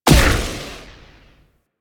Disc Shot Explosion; Disc Moa

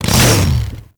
Saw Melee Swing; Warframe; Grn Ghoul Saw Player

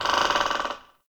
Tree Pine Branch Creak; Ambience; Trees

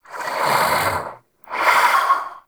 Vocal Breathe; Warframe; Duviri; Dax

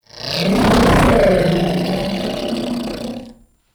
Ancient Tank Death, Enemies, Infested, Barks; Warframe

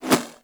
Throwing Star Whizzby

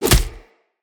Kick Impact Default; Envoy

